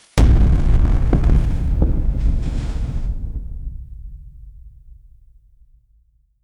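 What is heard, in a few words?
a large human body collapse; clothing rustle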